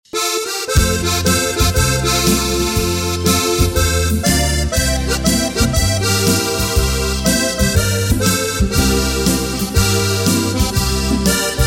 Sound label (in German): Duett